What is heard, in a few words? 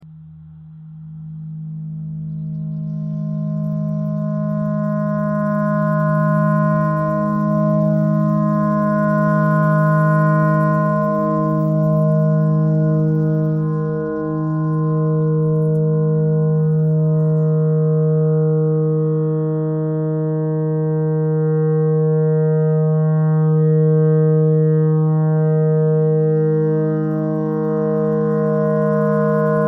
clarinet clarinet notes e simple